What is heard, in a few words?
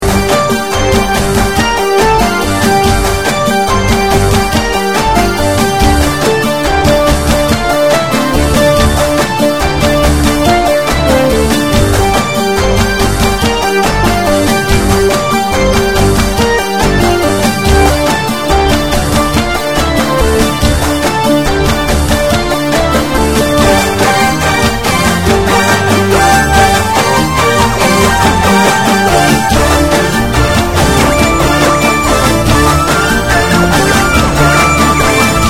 HAT